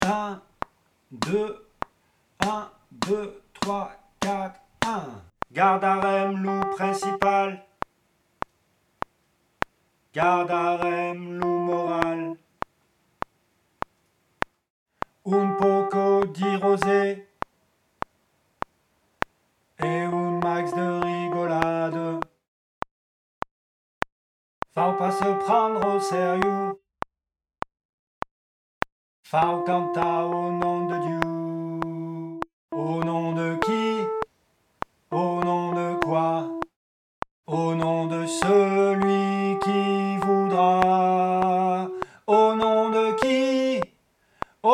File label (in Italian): Soprani, chorale